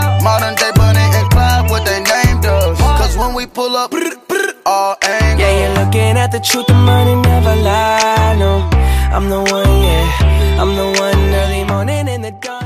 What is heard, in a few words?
hip hop